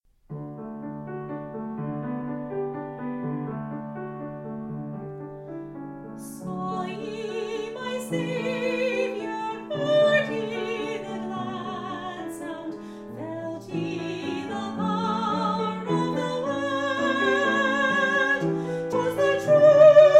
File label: communion hymn medhigh studio